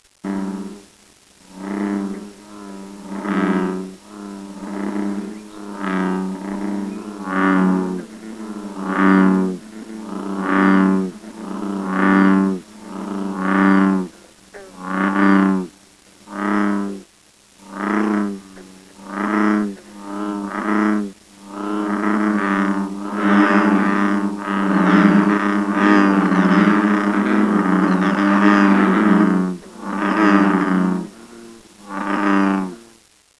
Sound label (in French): ouaouaron